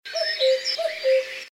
kukacka ziva

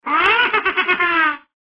kachna

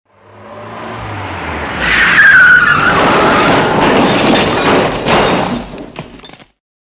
autohavarie

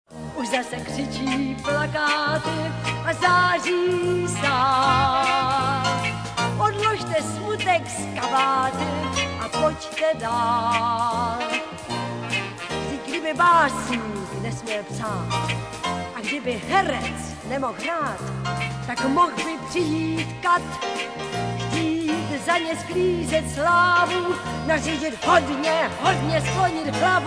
Herecky sanson

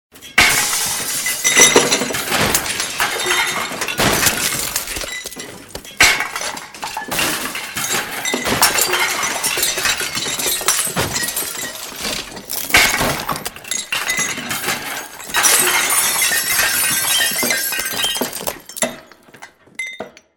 rozbijeni skla

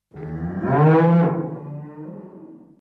krava

